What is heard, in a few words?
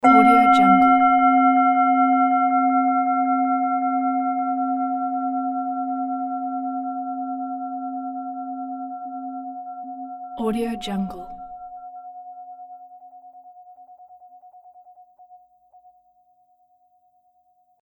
manipuri singing bowl 528 hertz; teramangala